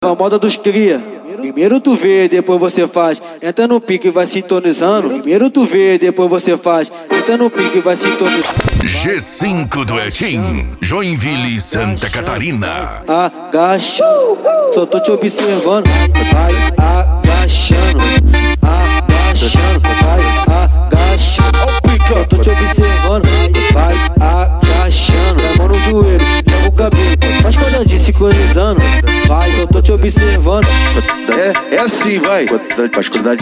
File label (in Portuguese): mega funk e funk mega bass